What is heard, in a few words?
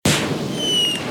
Jetpack Start; City Zombie